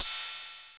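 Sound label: Hat Open Analogue; Drum Hits; Nu Dance Electro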